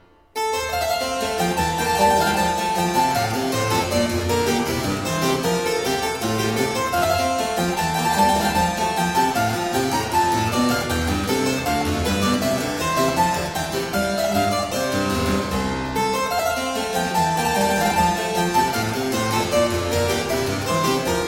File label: Suite No 5 in G major Bourree; BWV 816; Bach; Suites Francesi